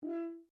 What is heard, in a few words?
HORN E5 MP SHORT STOPPED, French Horn in F; Alexander French Horn in F; Horns; Musical